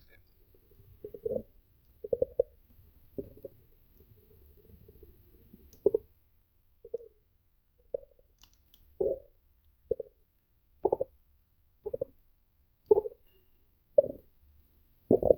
fetal sounds maternal bow, amniotic fluid

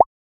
bubbles

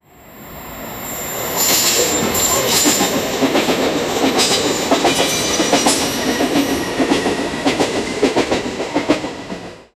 a modern train pulling ou

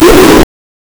heavy powerful swing deep, attack

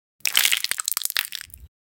damaging sound, ice

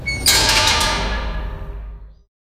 medieval sound of metal, castle

